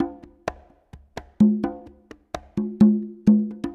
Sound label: Loops 128BPM, Drumloops